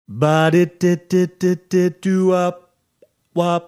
singing baah dit dit dit dit dit doo wop wop bad is bad